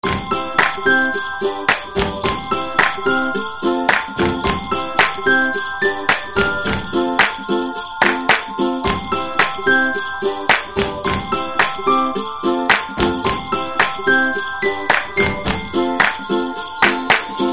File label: Magic Christmas